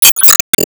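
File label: Battle Enemy Info Return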